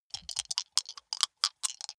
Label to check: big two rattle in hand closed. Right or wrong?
Right